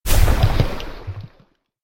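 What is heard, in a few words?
sounds of magic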